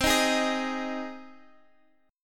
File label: C Minor Sharp 5th, Guitar, Half Step Down